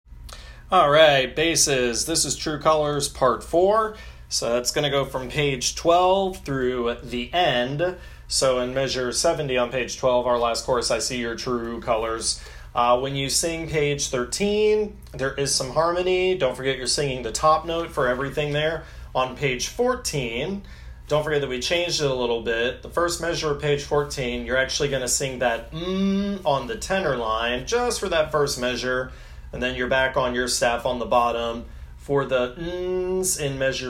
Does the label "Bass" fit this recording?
Yes